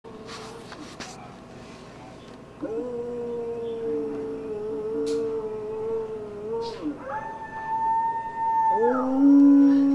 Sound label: howling then scream close camcorder